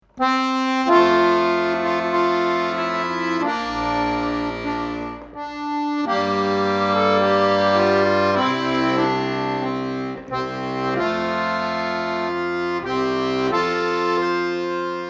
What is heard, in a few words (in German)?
Neujahrslied Akkordeon Arrangement